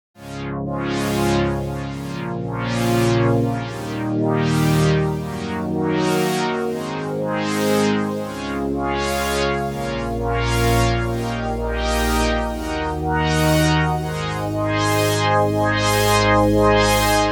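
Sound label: sequence nebula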